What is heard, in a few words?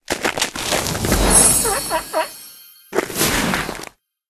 win screen marshmallow; Candy Crush Friends